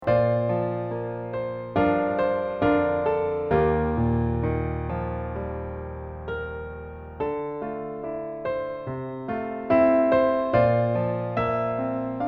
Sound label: accomp